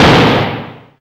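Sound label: LO REZ EXPLOSION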